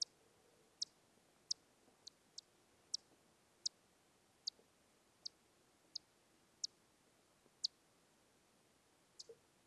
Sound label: long legged myotis